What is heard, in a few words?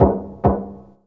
knock; Umineko SFX